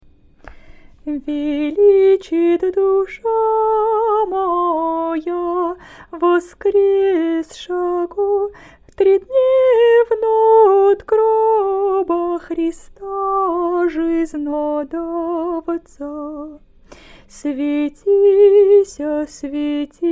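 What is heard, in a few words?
easter canon byzantine hirmos slower, singing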